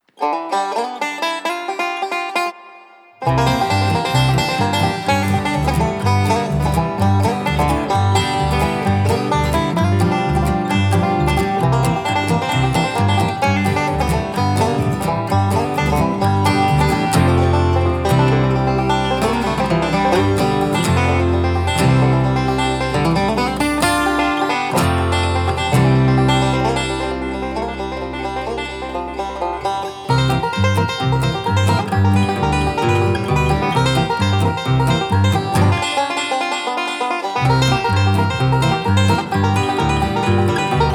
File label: Banjo Mountain Blues